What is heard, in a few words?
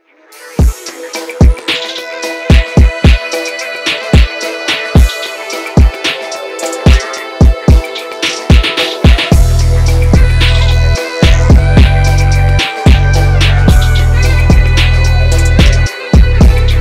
phonk remix